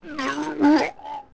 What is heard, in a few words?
Ganondorf Puke